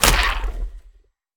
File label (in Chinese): 嘎枝之心破坏声